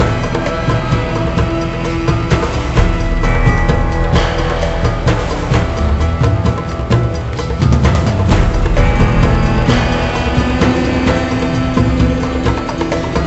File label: Music Tunnel; legend of the guardians the owls of ga hoole 2010 nds